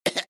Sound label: laugh; tinker